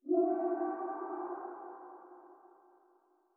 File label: scream far